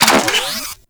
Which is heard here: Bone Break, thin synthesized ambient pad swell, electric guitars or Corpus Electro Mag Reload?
Corpus Electro Mag Reload